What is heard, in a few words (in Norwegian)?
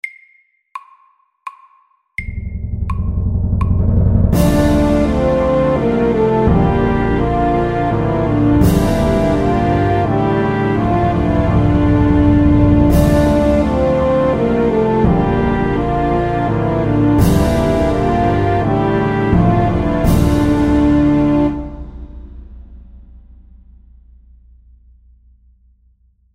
Filmmusikk